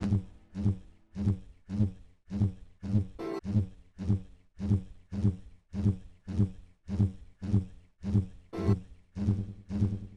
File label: buzz